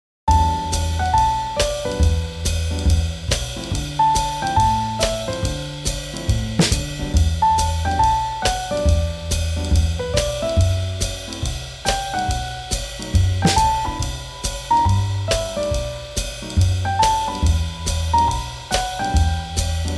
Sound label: Swing Jazz